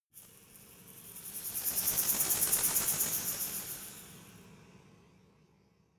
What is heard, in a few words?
resonator rattle dynamic